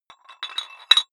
dumbbell put on rattling weight hit, fitnessstudio